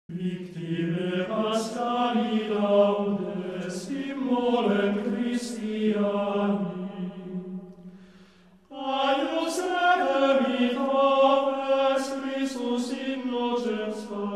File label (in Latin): Victimae paschali, Monodia; TEXTURAS